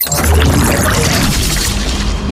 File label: cool cyber fill thingy